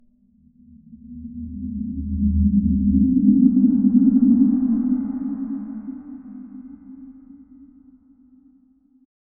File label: creepy ambient; ghost